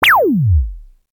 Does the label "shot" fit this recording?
Yes